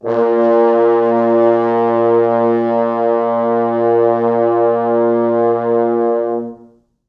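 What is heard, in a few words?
French horns sustain A#1; East West; Quantum Leap Horns Sax; 4 FrHorns SUS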